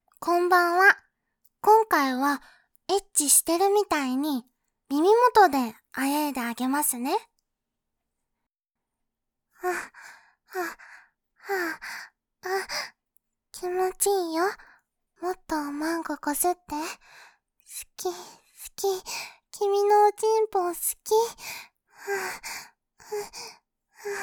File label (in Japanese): 耳元嘘喘ぎ